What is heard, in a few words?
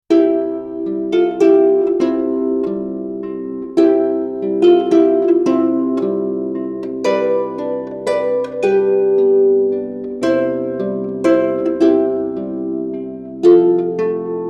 celtic harp